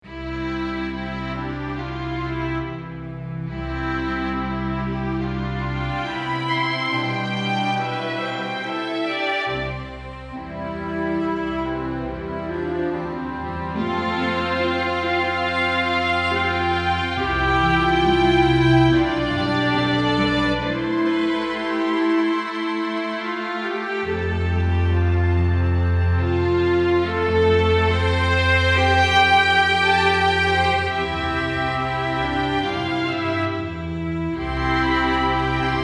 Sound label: String Ensemble